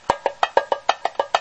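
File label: bongo chime, cheerful, light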